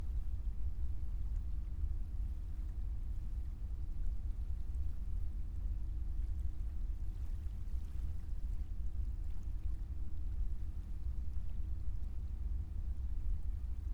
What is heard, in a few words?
Hydrophone